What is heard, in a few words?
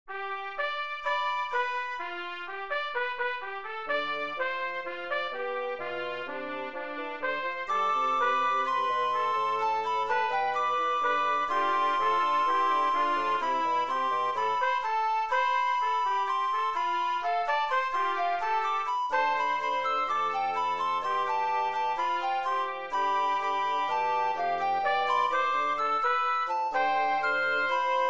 brass trio